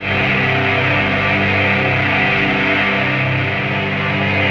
BASS SLABS; Zero G; Malice In Wonderland

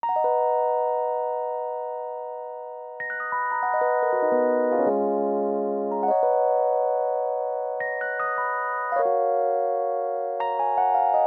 rhodes, Smooth RnB, Ebmin; Big Fish Audio; Legendary; Happiness

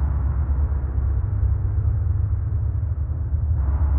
sparse soundscape samples; ReLooped

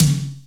TOM, Dance

